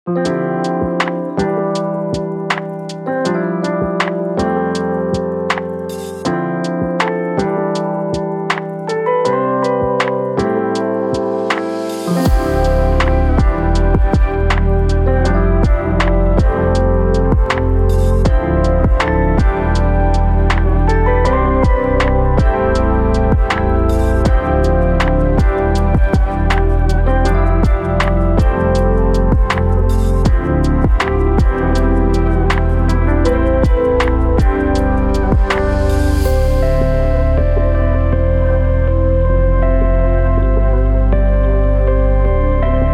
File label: Mellow Lofi